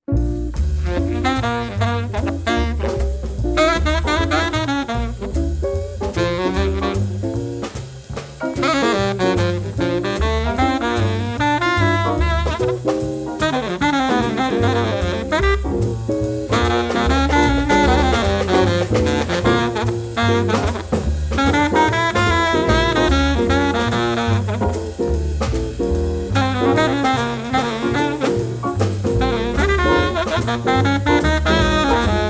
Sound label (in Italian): Swing per sax baritono